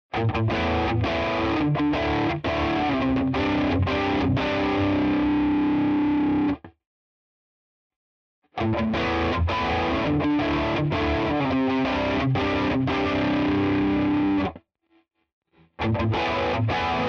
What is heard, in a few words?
AxisFlanger crunch; all on 12 Depth 5 Settings